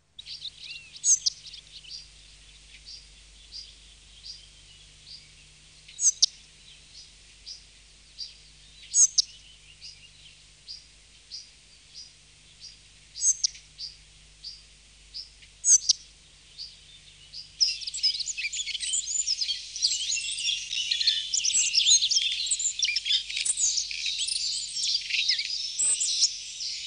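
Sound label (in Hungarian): Szürke légykapó; Madarak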